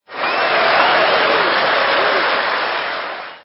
crowd applause

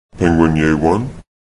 penguin yay

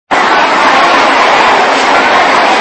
crowd

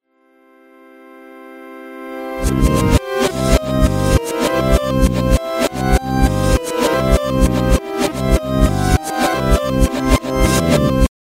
wrong backing, reverse

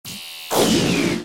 bomb

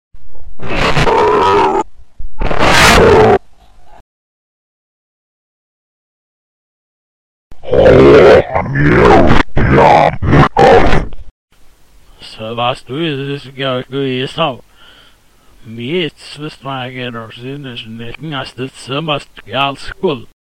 train hole; reverse

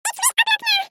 clock

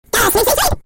sweep

